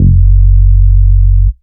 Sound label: Ultimate Bass Root F#; Vengeance Samples